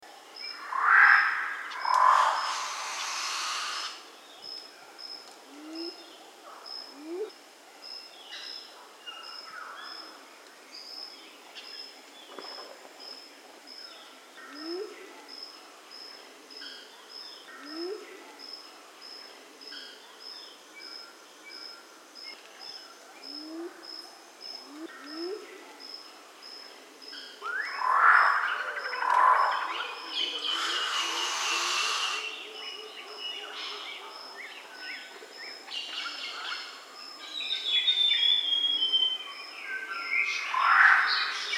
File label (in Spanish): canto ave del paraiso gruñidora oriental